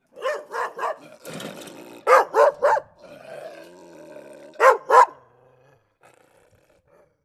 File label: angry dog